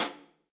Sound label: Hihat Closed Shank Big Punchy; Drum Hits; Standard Hits; Ableton; Factory Packs; Session Drums Club